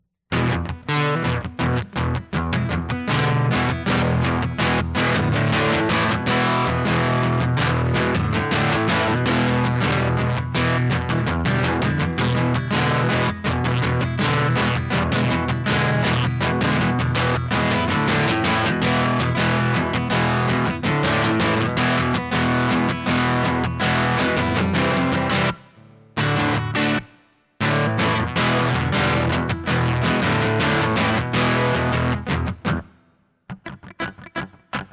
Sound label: electric; Disdain